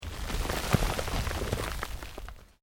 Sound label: Earth Loose Move Short